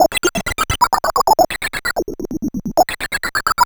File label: Sh101 Loop, 130BPM; musicradar; bitcrushed; Crushed Loops